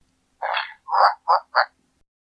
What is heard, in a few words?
Wiimote Sound; King Dedede